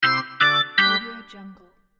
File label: quick organ win